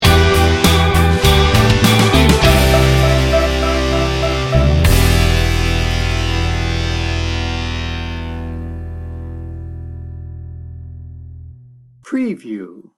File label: loose change sting bumper; Upbeat and bouncy. Featuring pulsing synth textures and a happy Reggaeton groove